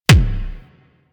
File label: Weapon Punch Rifle; Warframe; Weapons; Rifles